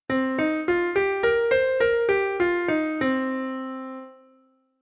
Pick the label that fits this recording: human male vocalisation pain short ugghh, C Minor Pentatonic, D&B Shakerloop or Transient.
C Minor Pentatonic